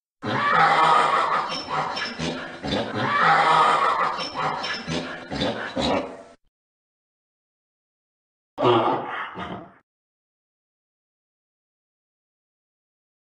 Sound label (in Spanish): SONIDOS DEL MULO